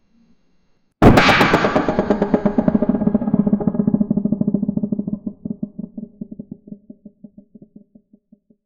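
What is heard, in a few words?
chicken, poultry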